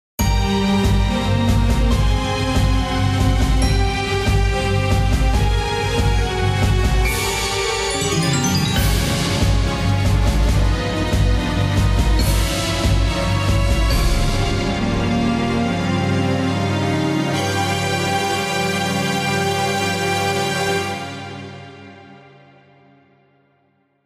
Scifi Theme

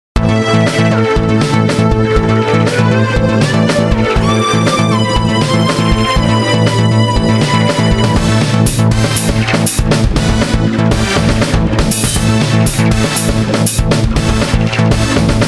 Futuristic Robot Battle Music

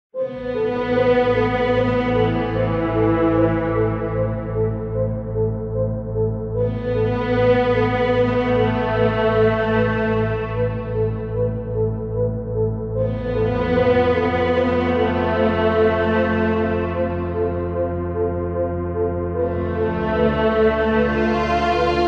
Royalty free music

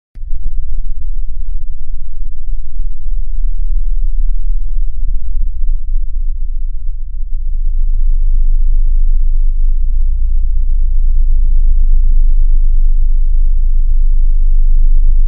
Depths of Space Sound Effect

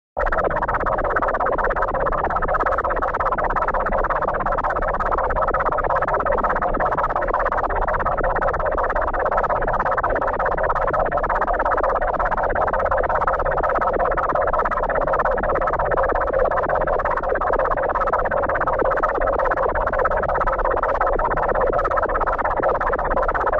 Custom Sonic Screwdriver Sound Effect

